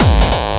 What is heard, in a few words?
Hard Kick